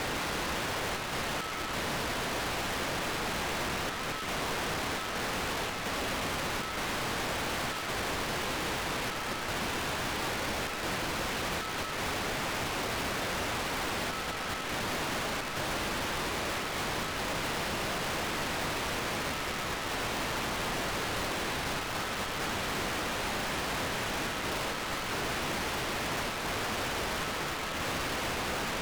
DoD Fire Alarm 139.675 MHz

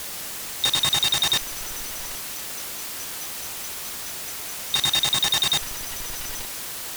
433920kHz IF; HDSDR

